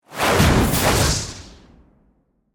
Magic Spell Whoosh, Horror, Magic, Spell, Whoosh, To, Hit; Magic (Sound Effects:Science Fiction); BlastWave